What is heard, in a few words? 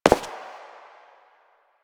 Step; Warframe; Koumei